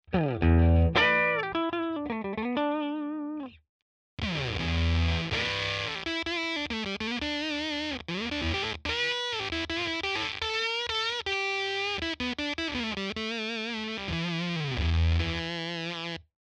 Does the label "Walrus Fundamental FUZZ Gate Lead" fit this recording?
Yes